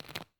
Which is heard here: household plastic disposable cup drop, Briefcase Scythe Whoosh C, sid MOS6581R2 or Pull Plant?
Pull Plant